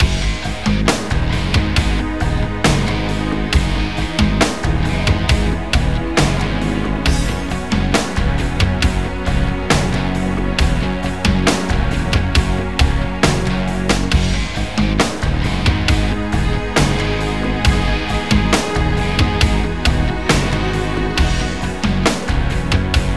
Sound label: Groove loop